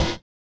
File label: collision; Spectrasonics; Metamorphosis